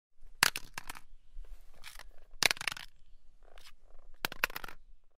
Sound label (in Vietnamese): tieng do vat nhua roi rot danh mat